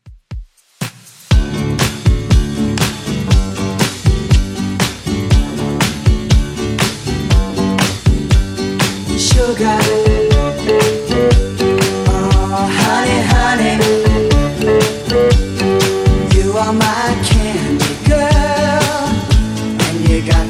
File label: 70s Redrum 120bpm